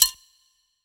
Perc, Boom Bap Drum

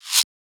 Shaker

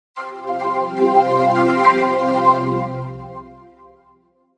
Windows XP Startup Sound in Faery, Vocoder

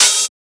Open Hat; FLPRO

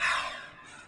vocal "ah", Vocals & Chants

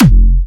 Springer F4, Big Room Kicks

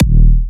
Hitter D#4, Big Room Kicks

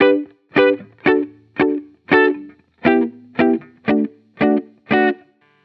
Electric Chord Loop - 170 BPM G Maj; Cymatics

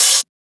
Open Hat; FLPRO

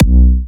Hitter B4, Big Room Kicks

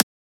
Transient; FLPRO

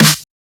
Snare; FLPRO; Snares

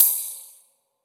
Ride; FLPRO

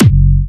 Fatterr F#4, Big Room Kicks

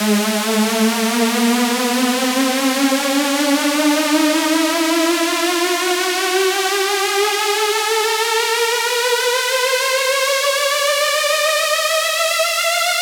Saw Ab 8 Bars

